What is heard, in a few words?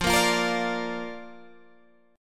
F 6th Suspended 4th F, chord; F64 strummed